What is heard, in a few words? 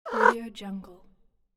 cat purr